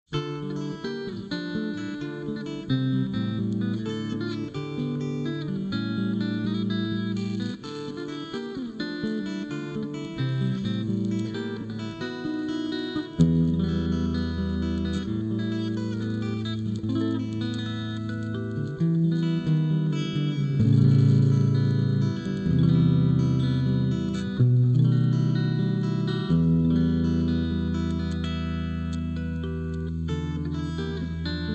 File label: Instrumental